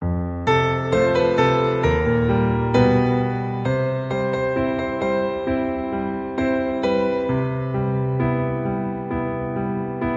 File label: Piano Cover